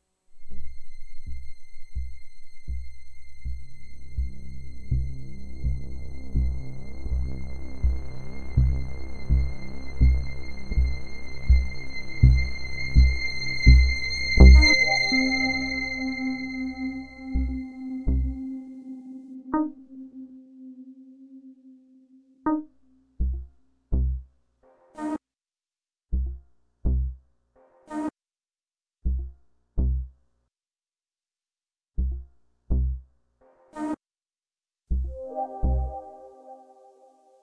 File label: Ending